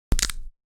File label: Crunch